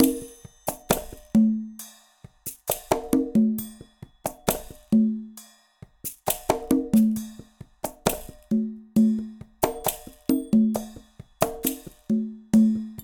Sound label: Ballad 67bpm, Latin Percussion; Ableton; Factory Packs